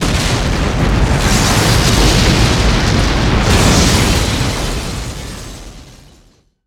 Explo Large Bomb; Destruction

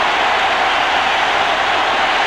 Baseball Crowd Cheer Loop; SFX Kit; Crowds

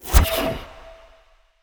Ice Spell Utility Levitation; Arctic Aura

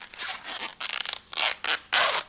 Tear Corn Husk; SFX Kit

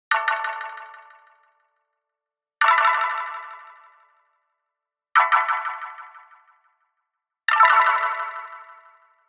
Arcade Click Neutral; boom library; The Interface

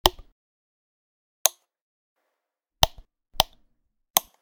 switches; NS Studios - ultra sound collection

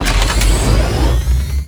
Pickup Scifi Health

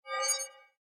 Crystal; Blind Quest - The Frost Demon

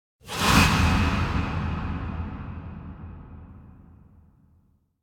Impact Distant Deep Reverberant Breath; Hollywood Edge; sound designer's toolkit v2

